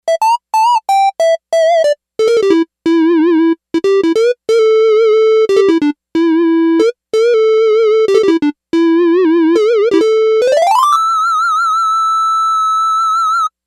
Sy.Solo, Yamaha DX11; Factory Preset Voices

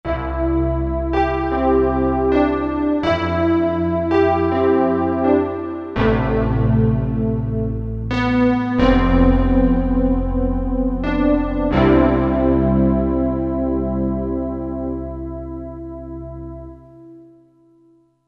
Fr Hrn Wurld, Korg MSC-11S Brass

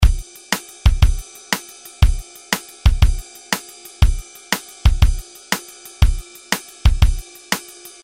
SLOWROCK, Roland R-8

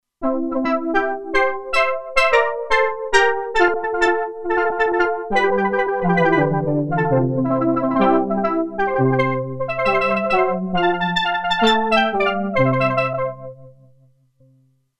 Steel Drum Band, Roland Alpha Juno-2; Original Sound Chart patches

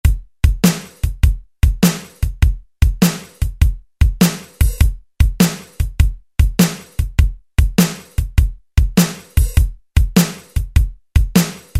Blues, Alesis SR-16, Preset Patterns

